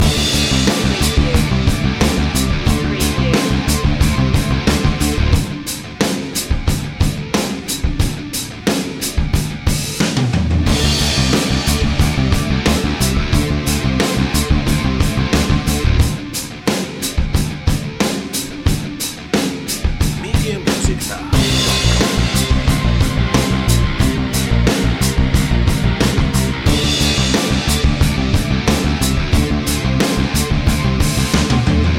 beat lab loop

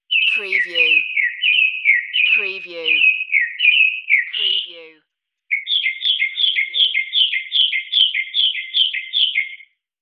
Mocking Bird 2 Calls

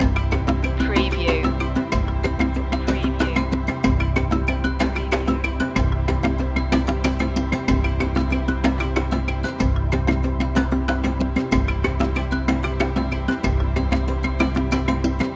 african spirit loop

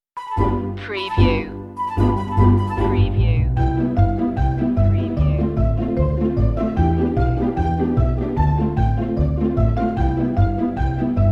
party no percussion

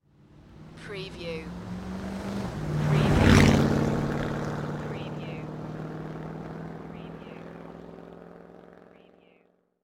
Truck Ford Drive By Right To Left; Vehicle / Transport Sounds / Lorry / Truck Sounds

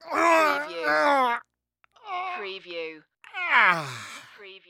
Grunt Strain Male

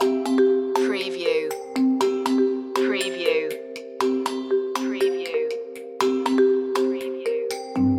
jumping jacks loop

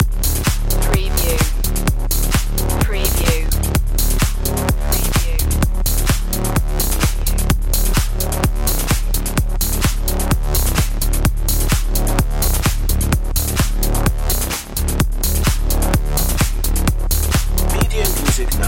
club miami loop

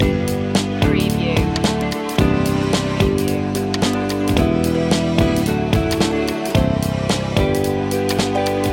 ocean life loop joiner; media music now

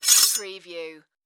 Sword Sheath Remove

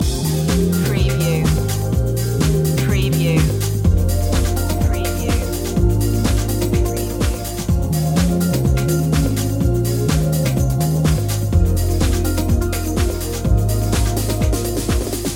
trip minimal loop

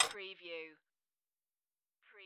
Pinball sound, little plate

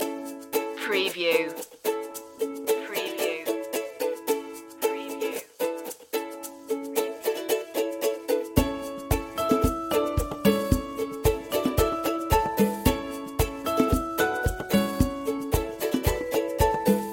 moving forward loop

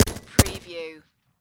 Machine Gun HKG36

